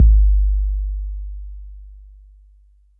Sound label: kick soft; lmms; drums